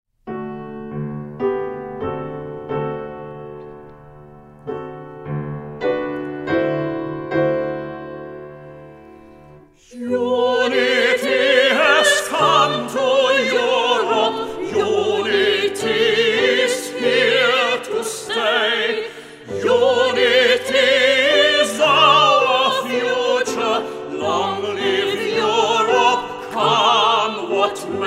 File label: engl Tenor Sopran; Europasymbole